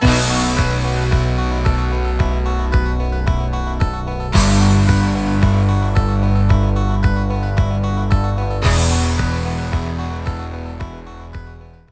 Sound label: Ditty; NBA ShootOut 2000; PS1